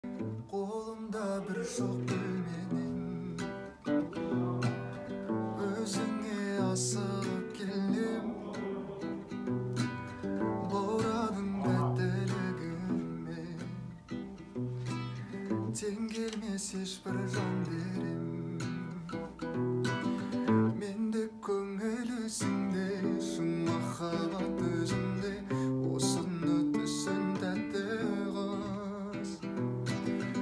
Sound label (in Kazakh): gitara